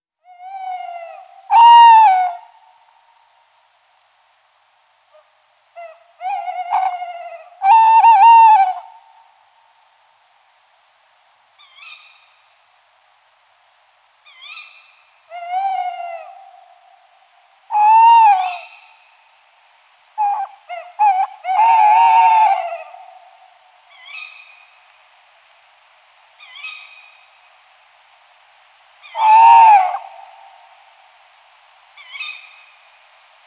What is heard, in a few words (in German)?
Tierstimme Waldkauz zwei Maennchen und Weibchen